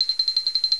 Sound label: enter; littlehouse